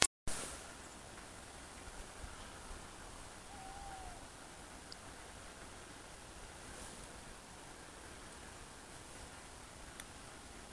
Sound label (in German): eule